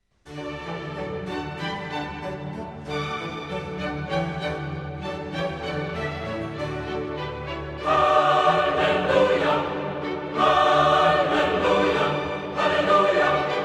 Hallelujah! Sung in German; Messiah, HWV 56 No. 44

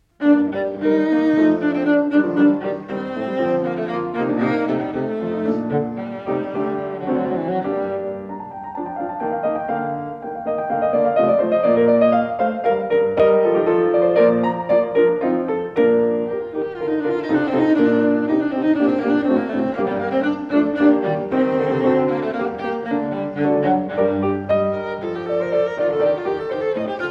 Cello Sonata in G Minor, Allegro; (After Oboe Concerto No. 3, HWV 287)

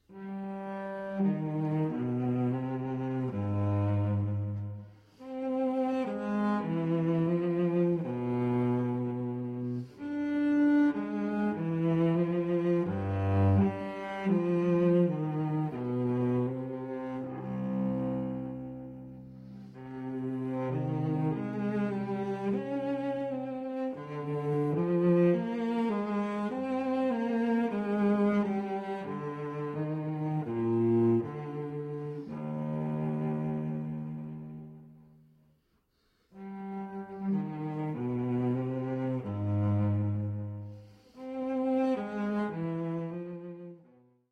Cello Suite in C Major Sarabande; Cello Suite No. 3 in C Major, BWV 1009; IV. Sarabande

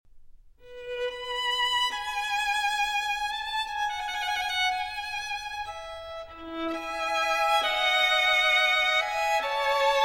Violin Partita, Loure; Violin Partita No. 3 in E Major; II. Loure

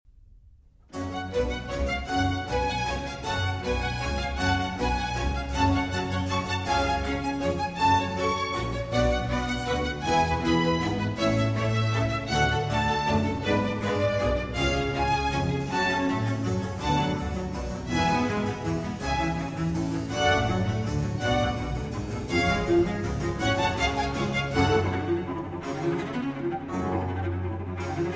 Cello Concerto in G Minor, Allegro; Vivaldi

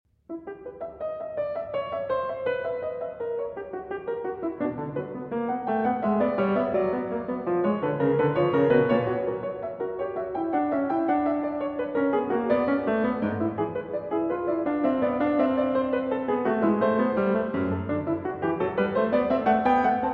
Fugue in E Minor; Prelude & Fugue in E Minor, BWV 855

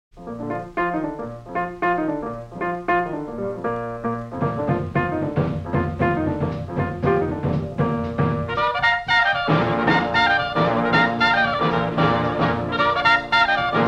B Flat Swing

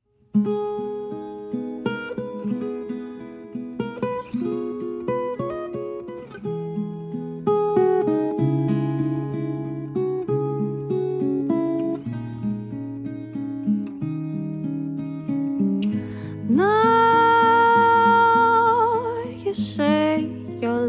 Acoustic

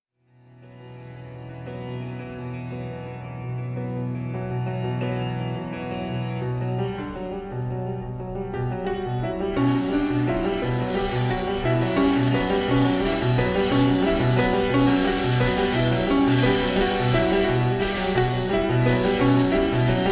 E Minor Piano

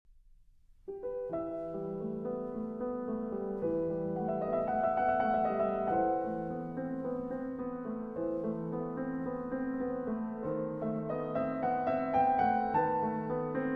Prelude in E Minor

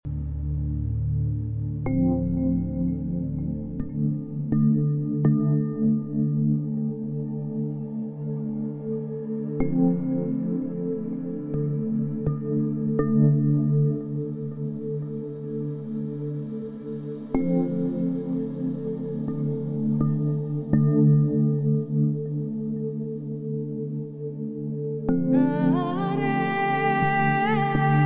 Relaxing Song

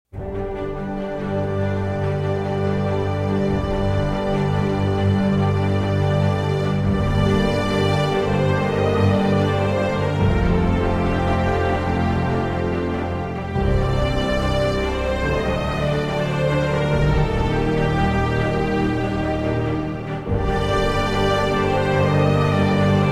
Instrumental

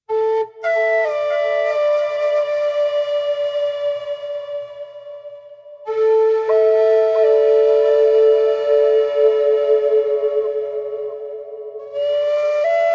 Love Flutes

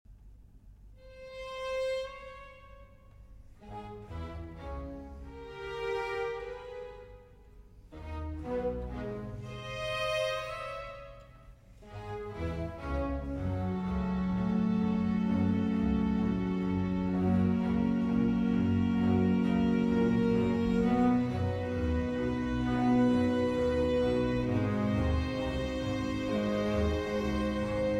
Cello Concerto in A Minor, Largo; Vivaldi; RV418; 2. (Largo)

